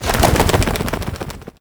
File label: pigeon wings takeoff; critters